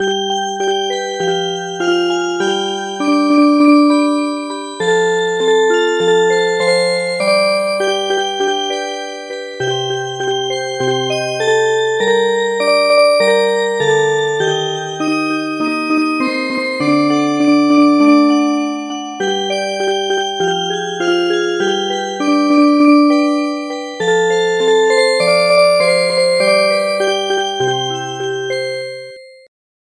Music Box; The bales are fun

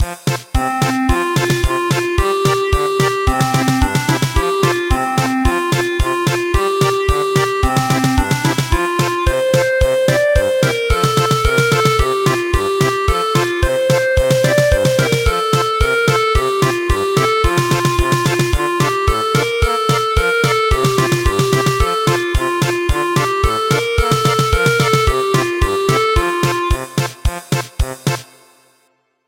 German Polka; El Rotlleto

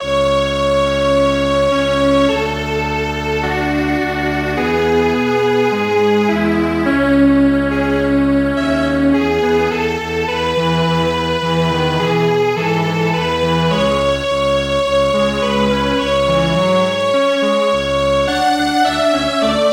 Strings Quartet

